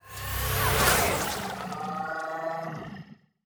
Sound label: hologram; Stellaris; Necroid portrait